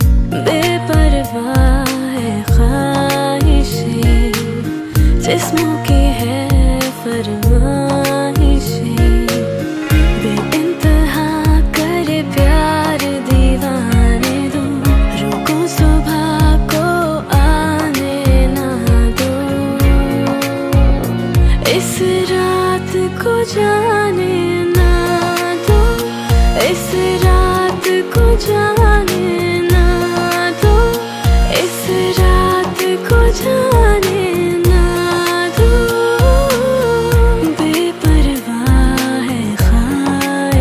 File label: New Romantic Hindi Song Ringtone